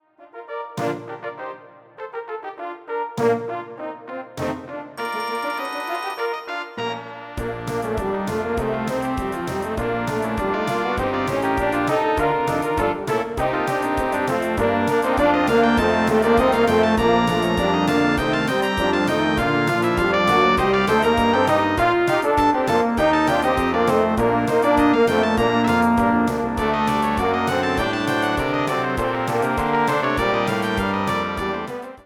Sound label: slapdash, brass